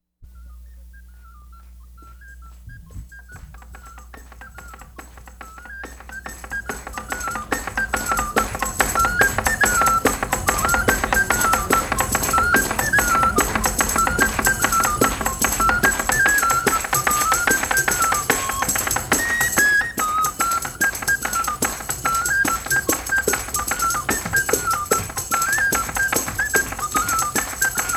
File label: Sicily Friscalettu Tarantella Flute, Percussion